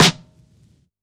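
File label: Vinyl Snare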